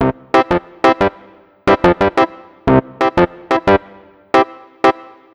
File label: Suitcase, keys; Сэмплы клавишных (Легкая музыка); 90BPM; Easy Listening; loop; Клавишные